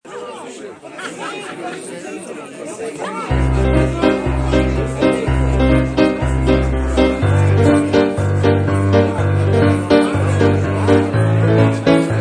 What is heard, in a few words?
Tango; Prayer in a Tango